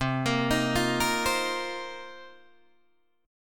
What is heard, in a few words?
C Minor 11th C Guitar DADGAD; Cm11 arpeggiated